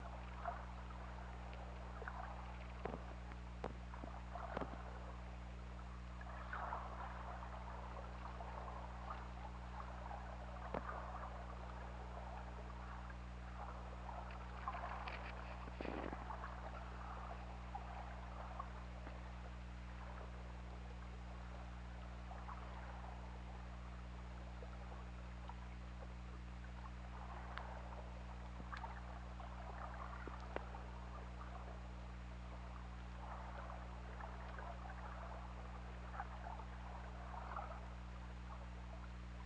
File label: Orca; OrcaLab